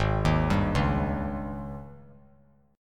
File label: Ab Major 7th Suspended 4th Ab down 3C 3F 3A 3D; arpeggiated; JGuitar